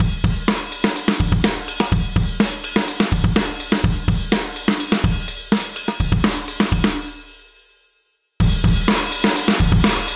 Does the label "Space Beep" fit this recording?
No